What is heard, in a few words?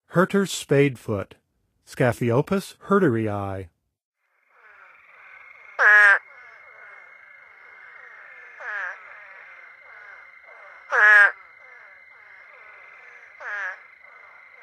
Hurters Spadefoot; North America; Songs of Frogs & Toads of North America